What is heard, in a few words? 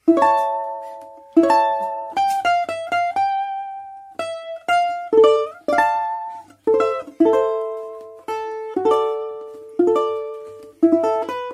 balalaika